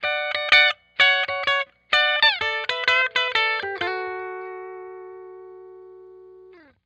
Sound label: high melody sample a